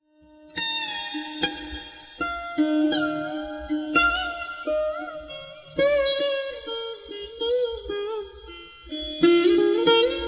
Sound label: sitar sample